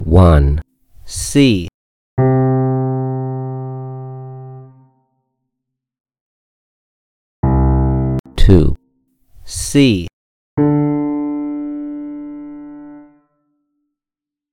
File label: Modulate Between C and G Chromatic Notes, Designated Degree Ear Training; key to your kingdom